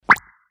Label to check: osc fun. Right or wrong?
Wrong